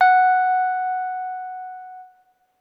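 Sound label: deep F#4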